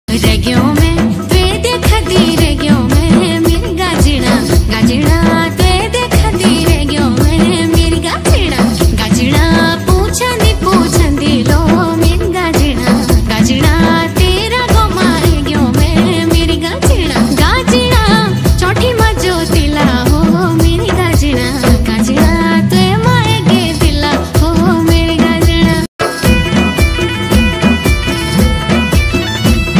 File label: uttrakhandi ringtone